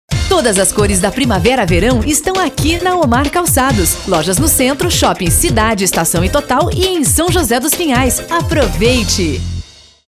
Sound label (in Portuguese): vinheta